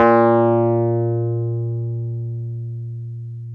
Wurly, Keyboards of The 60's and 70's

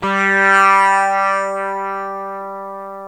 Sitar; Dano Sitar; Club-50 - Foundations

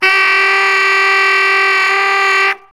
SAX GROW, T.Growl Long; Roland LCDP07 Super Sax

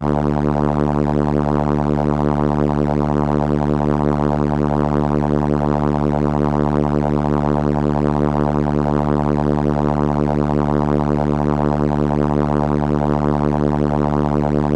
Viola D3, ARP Solina

